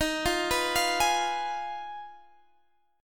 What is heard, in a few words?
B 6th Flat 5th E flat, guitar chord